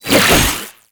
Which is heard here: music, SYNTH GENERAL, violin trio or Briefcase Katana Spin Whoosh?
Briefcase Katana Spin Whoosh